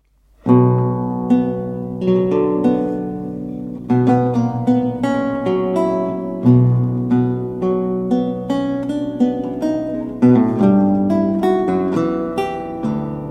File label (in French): Suite en Do mineur Prelude, Luth Baroque; Charles Mouton